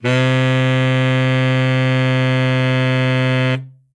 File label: sax bari C lo